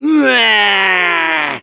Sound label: yell, fr; Heavy